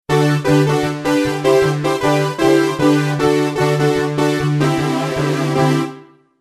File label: Stab; d-50